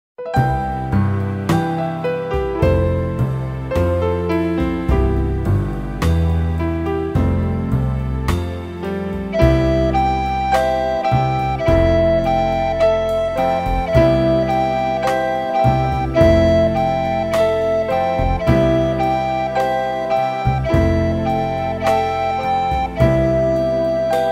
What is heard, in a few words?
backing track; recorder-tastic